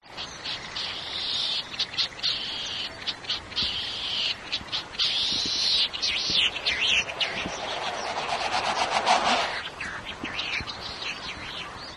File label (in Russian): zvuk japonskogo bekasa